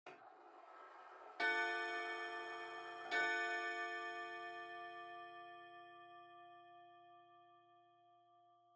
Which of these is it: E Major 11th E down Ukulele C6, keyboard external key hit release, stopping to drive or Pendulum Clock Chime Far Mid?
Pendulum Clock Chime Far Mid